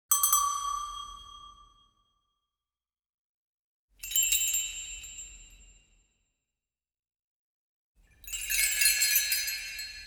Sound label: Jingle B Bell version; Secrets of the Silent Witch Original Soundtrack (2025)